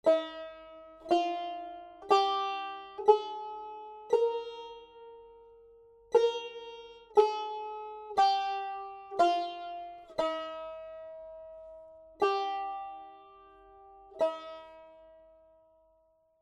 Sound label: Zabol Segah